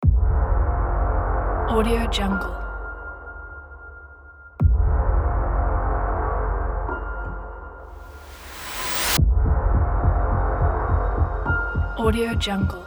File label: horror trailer intro; cinematic-alex